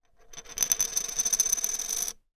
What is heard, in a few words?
foley token plastic down spin surface